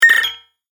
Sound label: Multimedia Chime, Technology, Multimedia, Chime; Chimes